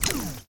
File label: Tool Trigger Release; TechTree